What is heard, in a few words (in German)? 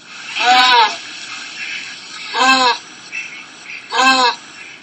pinguin sound; Alice 2 - Welten